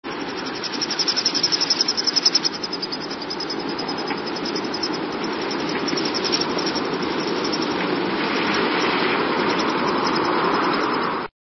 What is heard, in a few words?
Hooded Merganser, songs